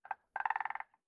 青蛙待机声

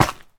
蛙明灯踩踏声

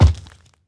僵尸疣猪兽脚步声

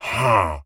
待机声; 唤魔者

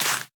甜浆果丛放置声

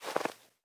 细雪踩踏声

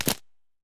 嘎枝冻结声

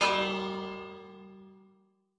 钟使用声

